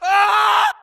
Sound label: grunt death crush; Titanfall 2